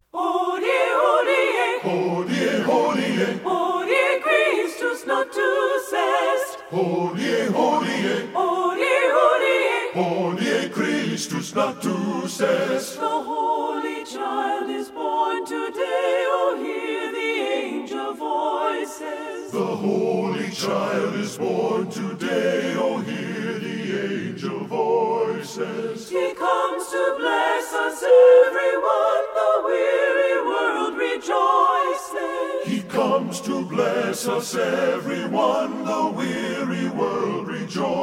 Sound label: fanfare for christmas, a cappella